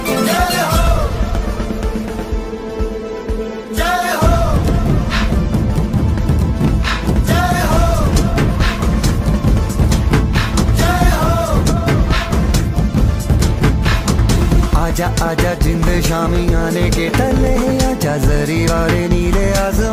Indian Republic Day Ringtone

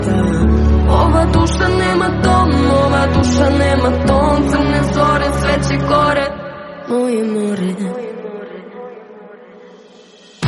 Funny Ringtone

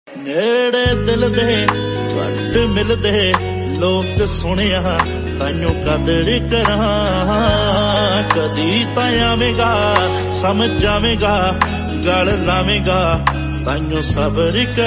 Punjabi Ringtone

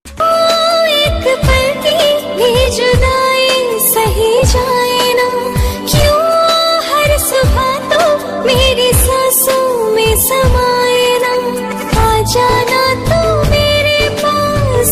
Romantic Female Song Ringtone

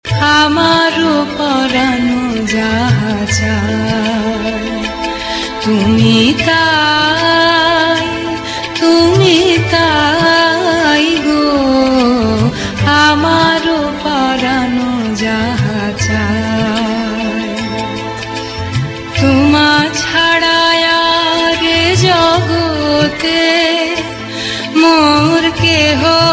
Bengali Ringtone

